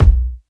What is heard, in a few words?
bass drum B key